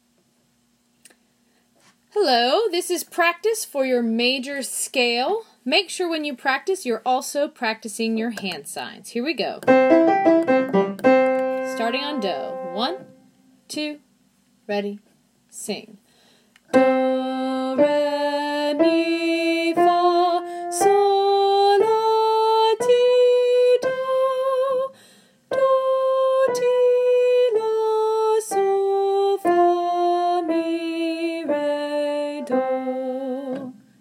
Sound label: Major Scale